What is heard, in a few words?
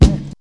clean bass drum one shot c key, steel kick drum sample tuned to the C note